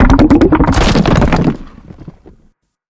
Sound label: Rock Prison Tell; Evolve; Behemoth